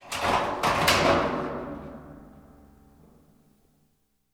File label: Corrugated Iron Sheet; 99Sounds; The Warehouse